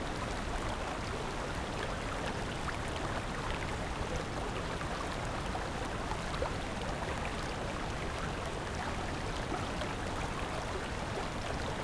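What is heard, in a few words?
waterfall Top loop; water splashes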